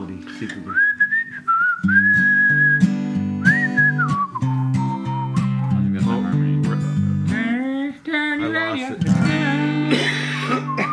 radio on three just whistle

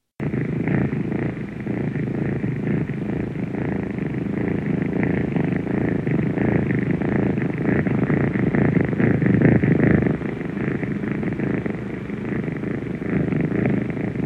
cat purrrr; animals

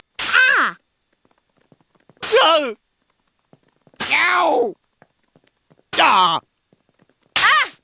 ouch; fam guy

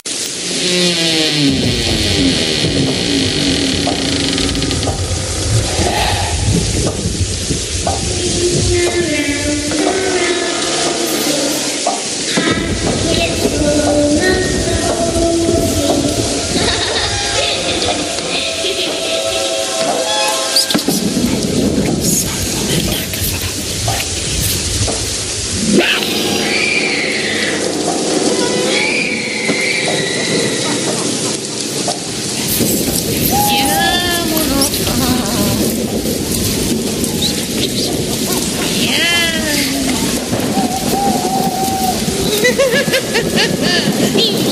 Holoween EFFECTS

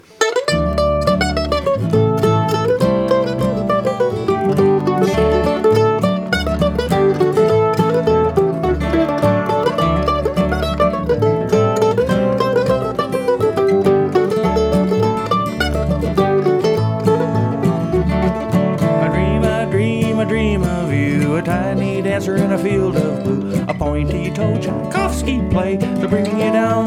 Coo Nest Key D

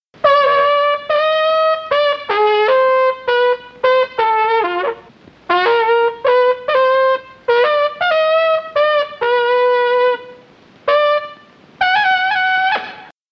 trumpet

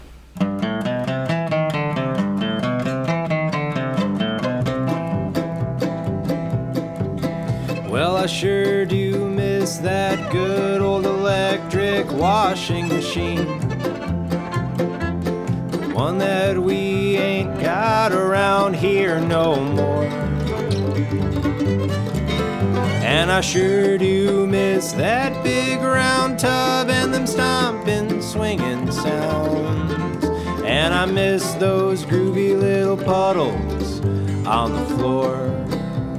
Washing Machine Key C

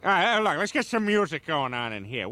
music; fam guy

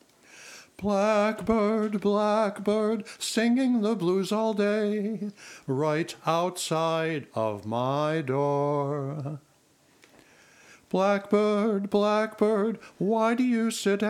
BYE BYE One Vocal

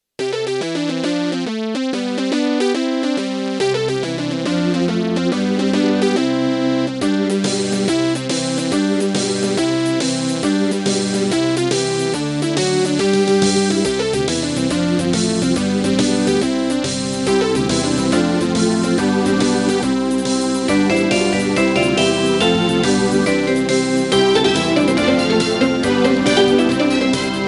SYNTH

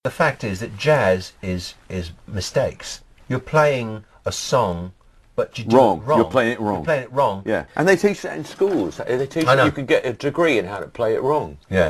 JAZZ WRONG

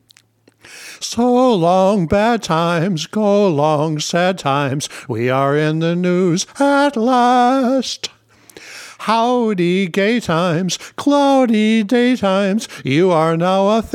Vocal Happy Amp Comp

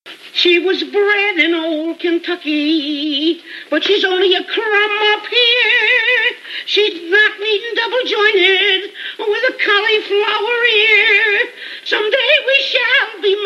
sings

